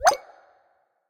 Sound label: sounds stopped; CosmicRage